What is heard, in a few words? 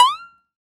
comedy spring twang pluck; sound effects one